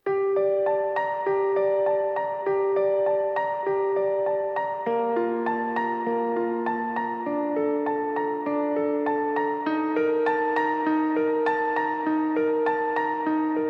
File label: Piano